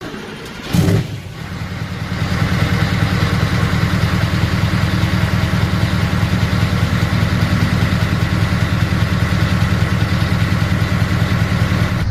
Scania T730 Euro 6

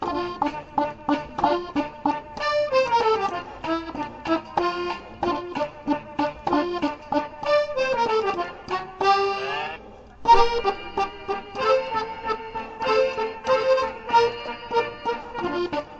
Acordeon; Chavo Del 8

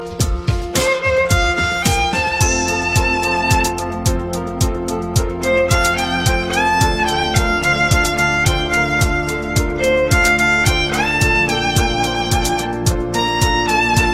Violin

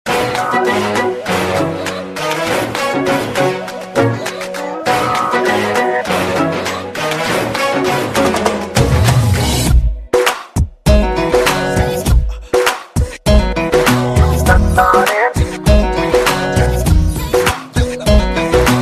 Music

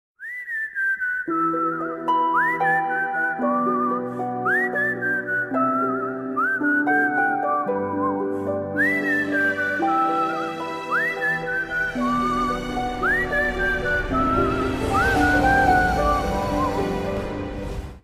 Ring Tone

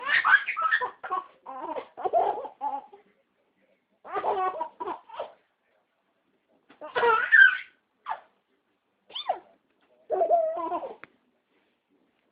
Baby Laugh

